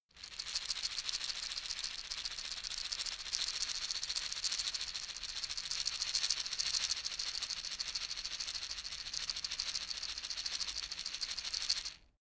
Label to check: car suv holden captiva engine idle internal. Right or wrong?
Wrong